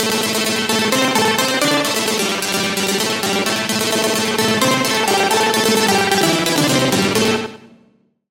Lead; Virtual Game; Routed Sound; Serum 2 Expansion - Hyper Gamer Vol.1

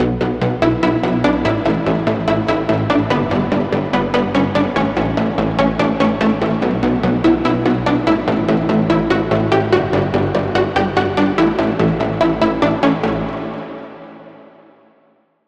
Pluck, Spirit Lock; Routed Sound; Vital Expansion - Reality Maker Vol.1

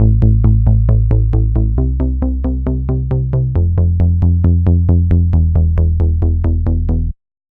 Bass; Routed Sound; Spire Expansion - Reality Maker Vol.1

